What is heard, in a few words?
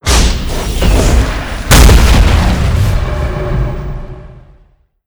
epicenter spell; sand king